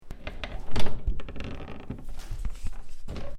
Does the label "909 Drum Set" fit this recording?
No